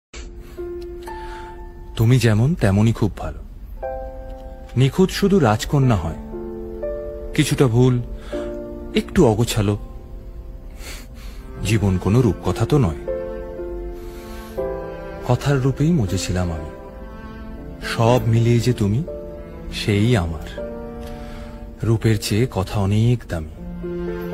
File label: bengali song